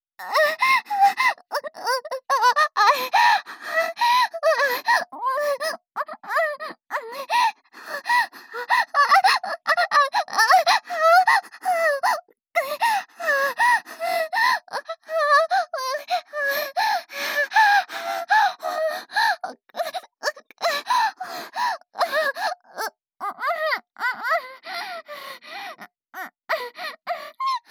行為に慣れていき、声も徐々に大きくなりながら感じている喘ぎ声

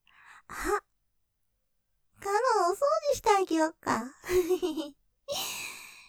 フェラ お掃除フェラしちゃう; ▼▼フェラ▼↓ここから◆◆お掃除フェラしちゃう♪; ステレオ

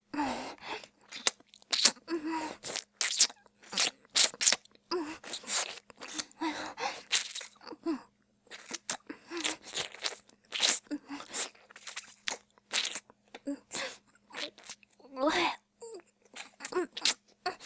普通に フェラ, 凌辱系 音声素材; Honey Drop

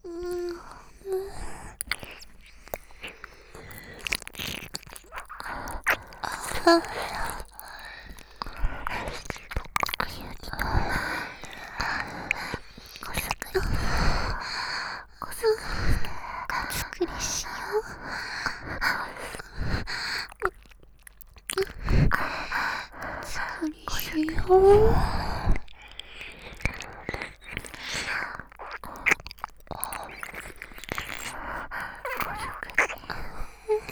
耳舐め 喘ぎ

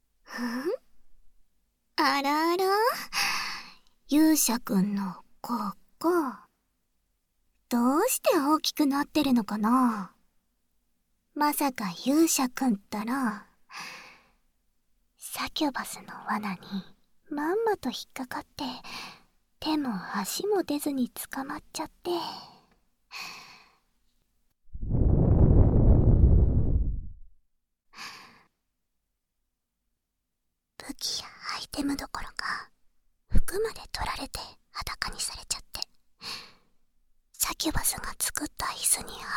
誘惑の耳舐め

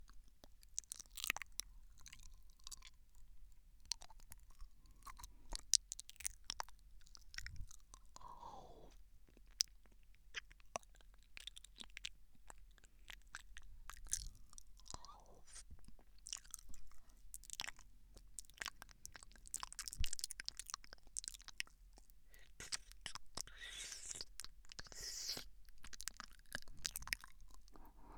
右耳舐め, ASMR

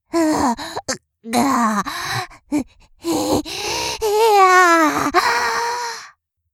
激しい痙攣; 失神中

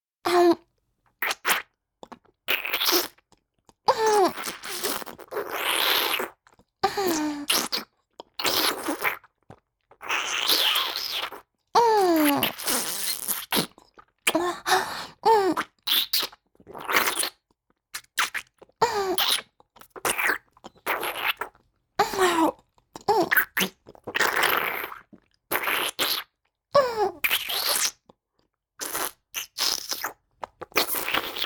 お掃除フェラ(中):亀頭を咥えて中に残っている精液を吸い出す, チュパ音; チュパ音抜粋集

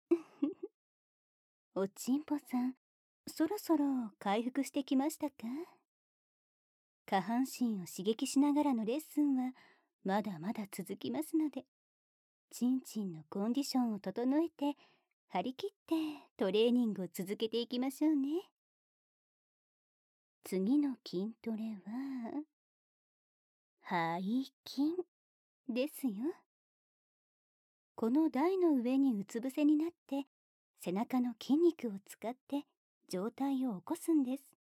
耳舐め床オナ手コキ; 耳舐め床オナ手コキでずぽずぽ背筋; 効果音なし; ジムトレーナーのお姉さん

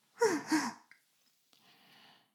フェラ音声; 古き城のドラゴニート2

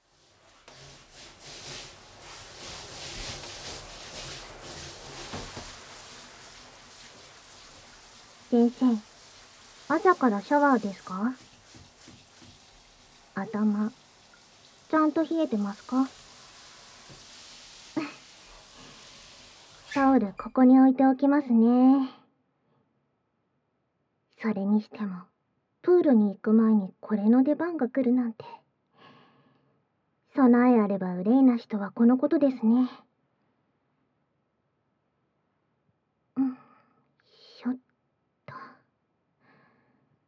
お風呂に入りましょう; 小林さんとお風呂に入りましょう。

